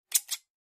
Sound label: clippers snip; garden shears